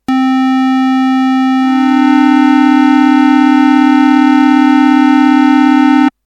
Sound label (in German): sekunde; harmonie